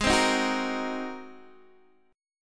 G sharp 9th G sharp Guitar DADGAD

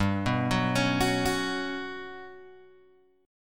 G Major 7th Flat 5th G down Guitar Open G

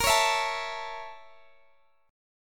A sharp Minor Major 7th Flat 5th; x,x,20,18,17,17; strummed

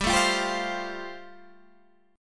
A-Minor 7th Sharp 5th G

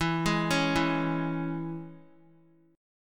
E 6th E, Guitar; arpeggiated

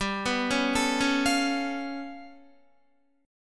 G 9th Flat 5th G down Guitar; arpeggiated

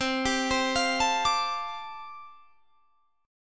G 7th Suspended 2nd Suspended 4th C chord

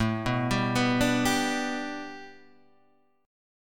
A 7th Suspended 2nd Suspended 4th; x,12,9,12,11,9,9,x

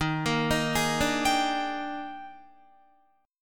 Eb Major 11th, Guitar; EbM11; arpeggiated; JGuitar

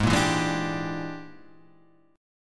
E 7th Sharp 9th Flat 5th Ab, chord

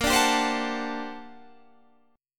G Minor Major 11th, Bb

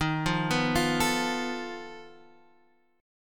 F 7th Flat 5th D sharp

